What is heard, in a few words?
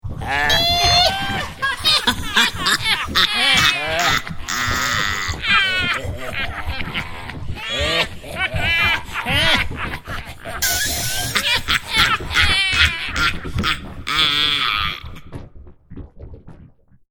Witches And Cauldron, An evil group of witches laughing around a boiling cauldron; Evil Laughs